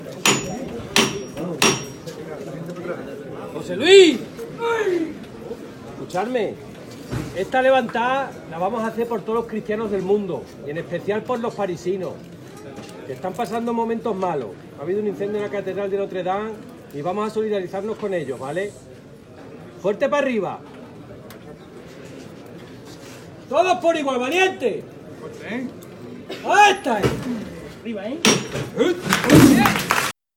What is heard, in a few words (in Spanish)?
SONIDO LEVANTA